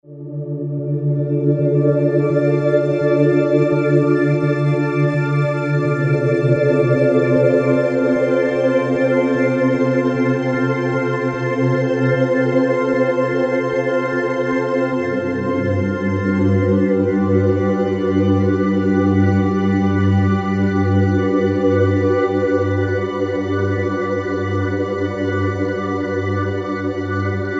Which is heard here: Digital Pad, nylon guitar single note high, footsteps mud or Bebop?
Digital Pad